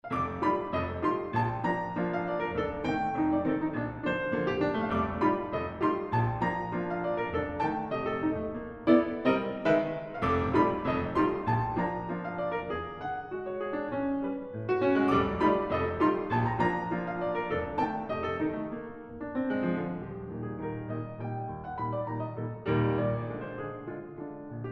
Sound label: Schottische